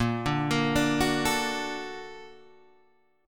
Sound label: A sharp Augmented Major 7th; A#+M7 Chord {6 5 8 7 7 5} arpeggiated